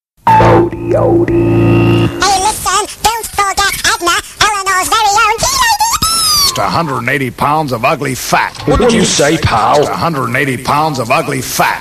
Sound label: Jingles